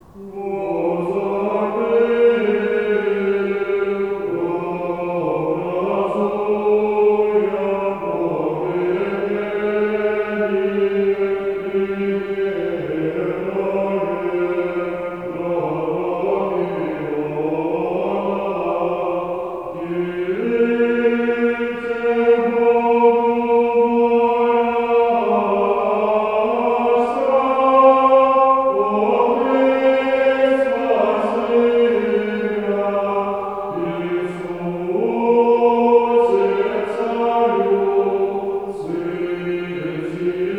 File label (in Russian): Благовещение. Ирмос 6 песни